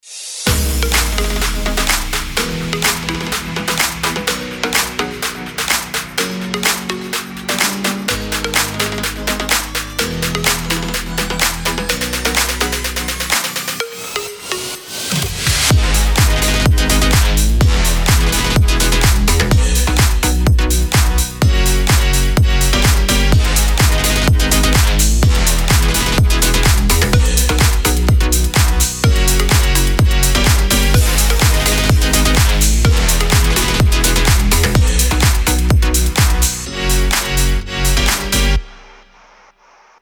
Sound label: PLUCK HOUSE G#MIN 126BPM; BED